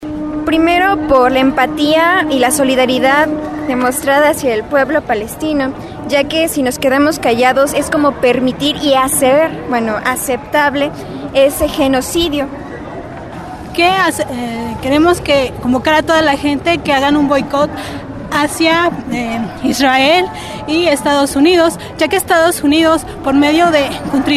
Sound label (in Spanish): marcha jovenas